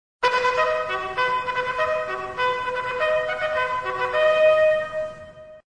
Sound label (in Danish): signal; regiment